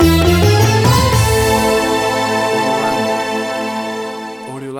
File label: Bangladesh Music Bed Stinger; AUDIOLANDER